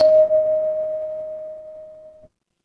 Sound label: instrument kalimba